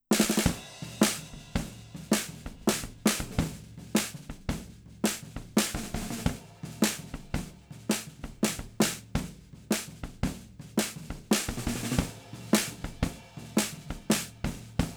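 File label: PGA98 Snare Drums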